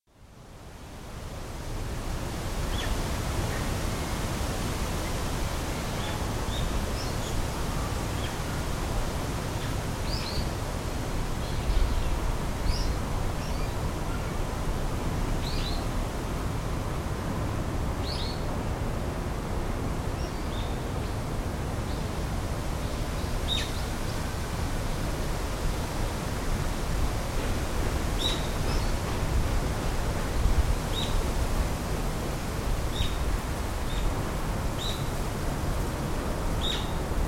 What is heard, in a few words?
sanno shrine